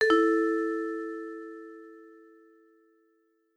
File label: Jingle, Notice; Nintendo Countdown Calendar - Event (Notice 2); Nintendo DSi